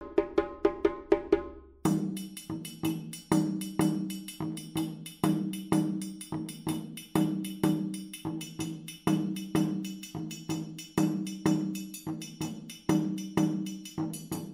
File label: Soli Rapide Dununs, djembe